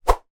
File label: foley stick bendy whoosh air fast; zapsplat